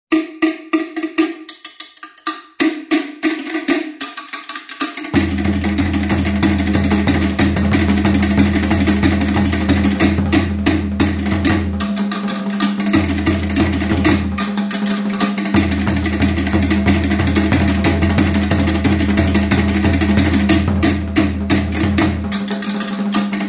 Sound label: Luau Drum Beats